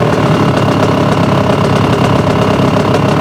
idle, YAMAHA

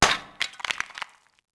marble hit, bullet hit; projectiles; Max Payne

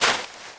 Walk Dirt; Player; Footsteps

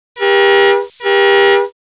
Horn; TrueCrimeStreetsOfLA; XBOX

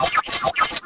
newlap; Quantum Redshift

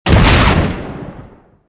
Explode

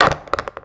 pocket; Virtual Pool Tournament Edition

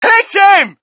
crowd, shout; Mike Tyson Heavyweight Boxing

